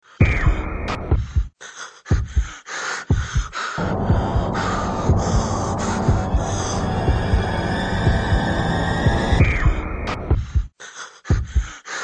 Scary Sounds

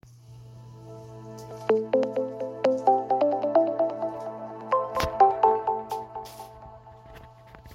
Major Minor

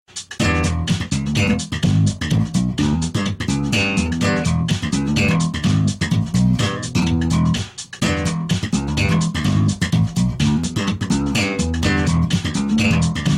Bass Slap

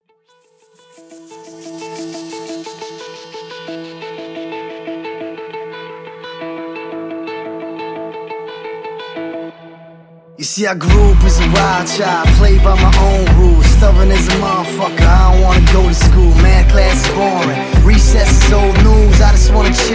Cowboy Ringtone

